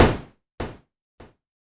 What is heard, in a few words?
tom, Korg ER-1; Kit 14